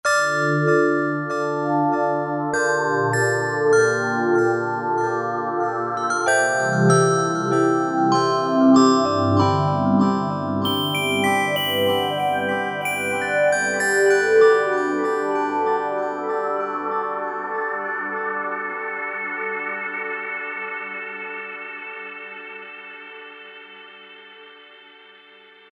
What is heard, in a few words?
Bell Space; Roland JP-8000; Factory Performances; MKS80 Bell-Space